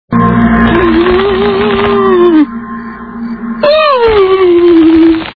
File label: shivering